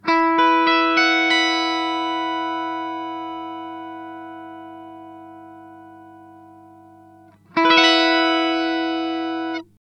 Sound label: Mooer Tender Octave X2 upper octave tone max